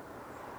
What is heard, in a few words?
Flight Call